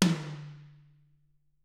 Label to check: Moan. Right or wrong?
Wrong